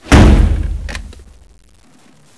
slower appear

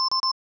plasma low cell

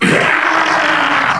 demon cry

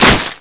hit glass

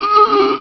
zombie pain, male

